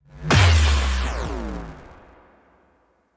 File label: crash; Rizline